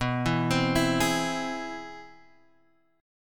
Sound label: C Augmented Major 7th B